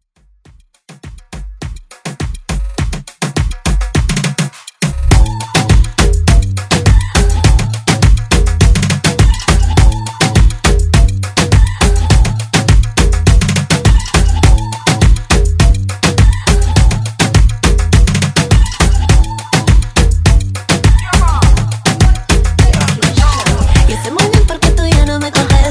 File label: Reggaeton; Redrum